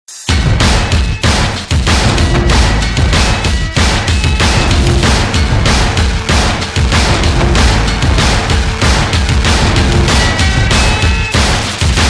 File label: Only Music